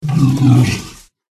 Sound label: zorb attack voice up